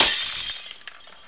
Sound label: glas brk; duke nukem total meltdown